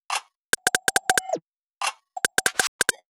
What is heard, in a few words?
drum Loop 140BPM Perc